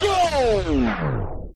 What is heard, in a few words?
Go Down Vox Transition